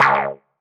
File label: error indication